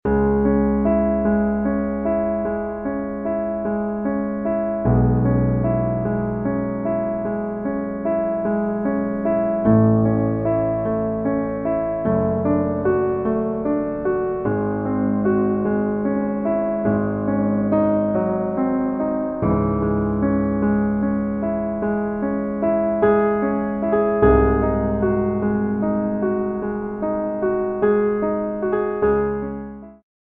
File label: Piano; honduras musical 7mo grado